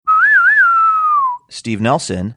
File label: whistling saying